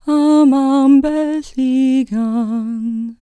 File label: CELTIC, Vocal Planet World Voices; ILIO; Vocal Planet VOL-5